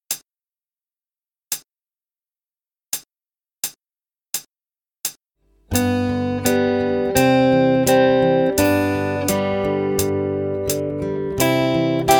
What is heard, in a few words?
3 Strings fast, guitar